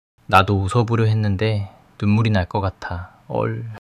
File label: crying